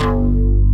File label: HRD PLCK BS, BASSES; Pro-Rec - Synthsonic